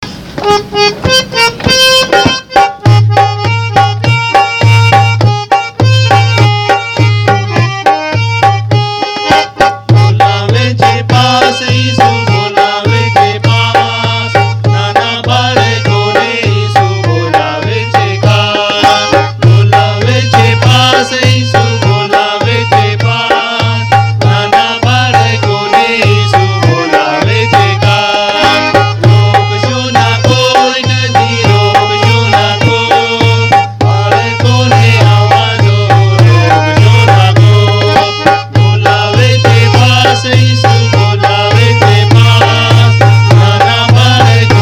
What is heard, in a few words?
Traditional Tune; 497 Bolave Che Pass Isu